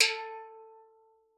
A3, STEELDRUMS; Xsample VOL-4

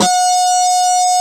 A4 GTR FB, Bass Guitar; AKAI S6000 CD-ROM - Volume 1; JOHNS GT

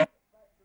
Perc Hi Speaker, Stylophone Beatbox

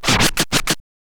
scratch short, Vinyl scratches

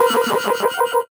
VocoBit Noise, 8-bit; musicradar; 8-bit bonanza

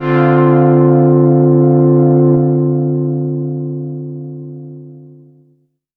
diggin, excessive stereo; E-MU Producer Series Vol. 6 – Analogia Project; Strictly Synthe

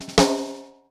FLAM SN TONE; CONSTRUCT 2

